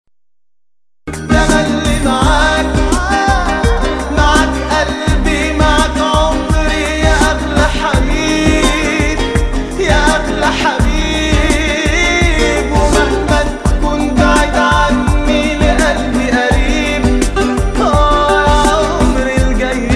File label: Arabic Ringtone; Jumeirah